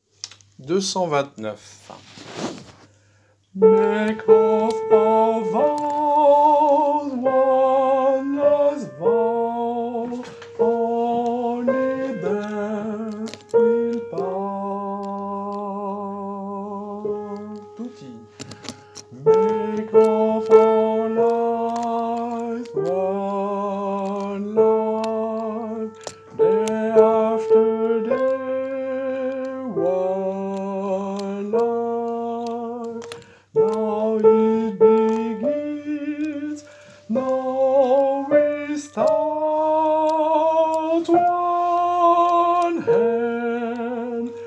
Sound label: soprano